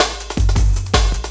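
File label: LOOP snare drum, Drum & Bass, 160 BPM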